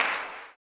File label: CLAP, Blues Soul; Spectrasonics Vocal Planet